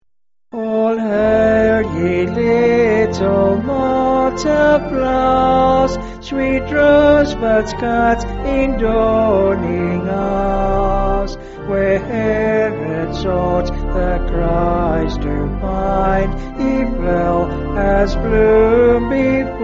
All Hail Ye Little Martyr Flowers, Sarratt

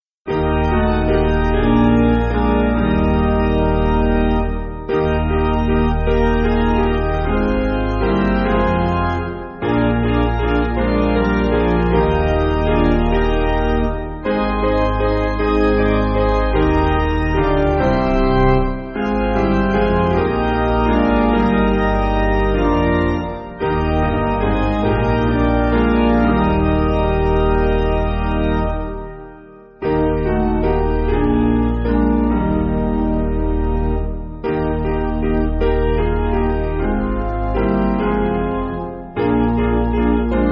Piano, Music Backing

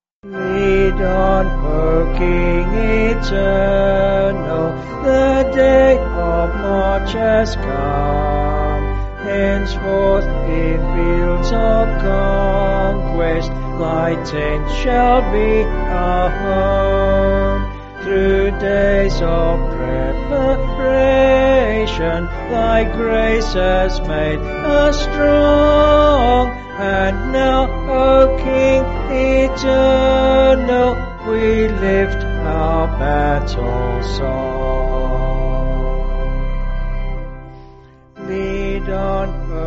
Lead On O King Eternal, Lancashire

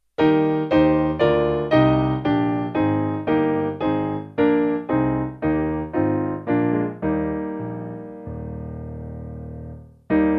Father Of Peace, Caithness, Piano

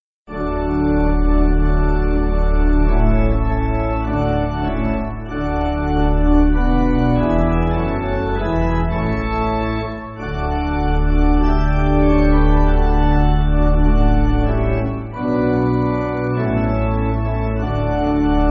Jesu Was Dying, pipe organ; L. Mason

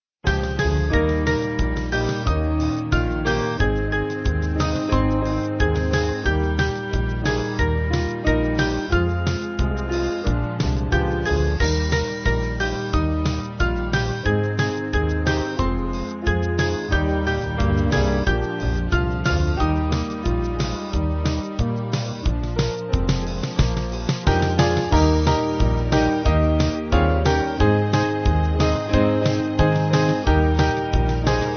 Band

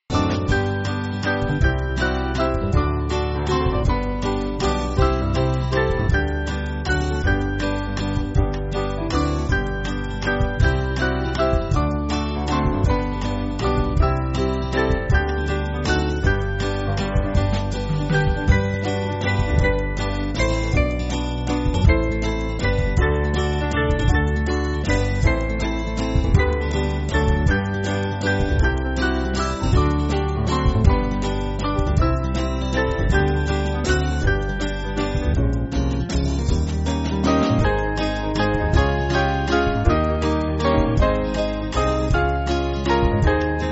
Band, Music Backing; If You Believe; Zimbabwe; 2/G-Ab

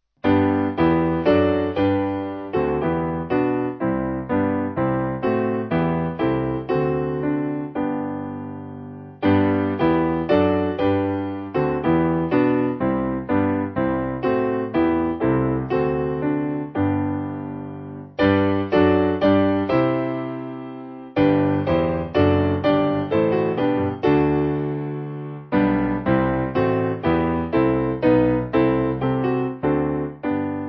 Piano

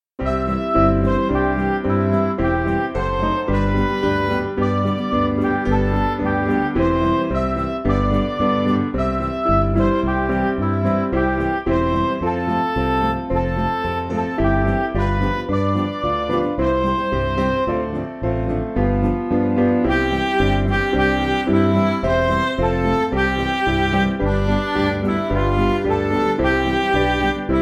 Music Backing Files for Churches

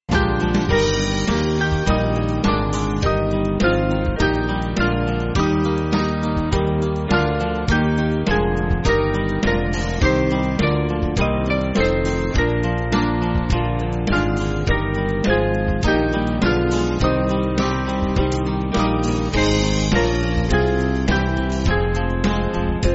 O God the Son Eternal, Band

